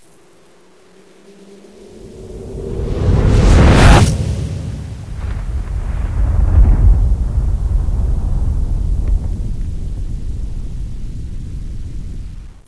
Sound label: explosion; svencoop; echoes; outtro